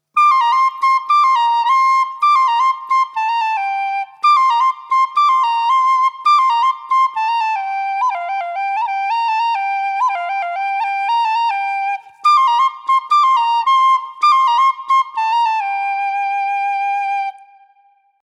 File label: whistle